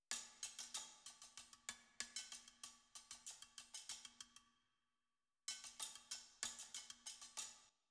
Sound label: metals high; combat results win; Netease